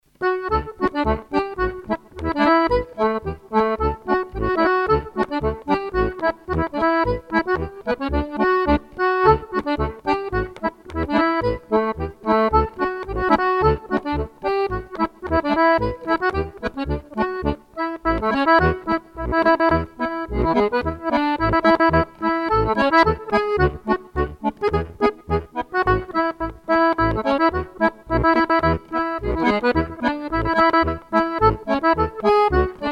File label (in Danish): Klokkespil Polka; Skottish Rejnlaender